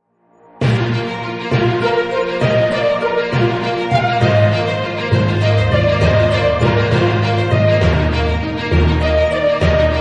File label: News Room News